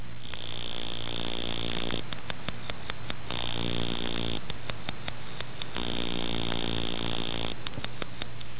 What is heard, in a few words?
pygmy puddle frog